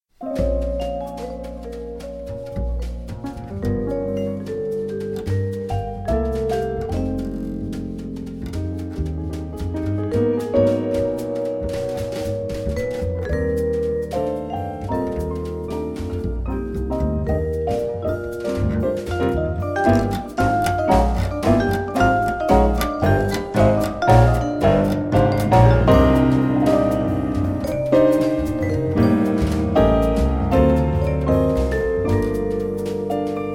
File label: quartet